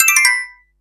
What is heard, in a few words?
alert